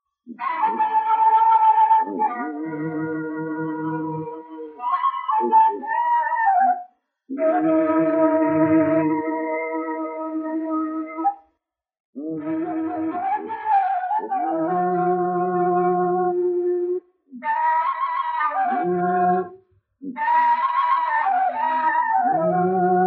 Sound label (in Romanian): De jale morteste fluier; Vaslui